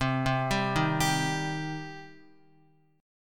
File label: C Minor C down Guitar; arpeggiated; JGuitar